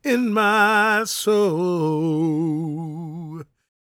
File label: GOSP MALE, Vocal Planet Gospel